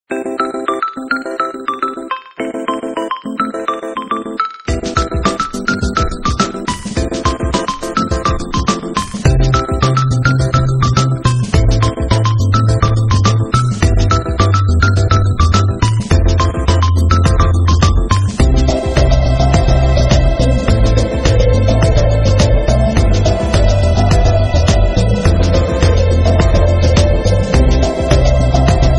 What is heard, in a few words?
Nokia Mobile